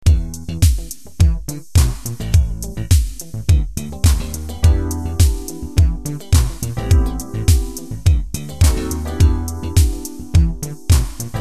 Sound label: brass funk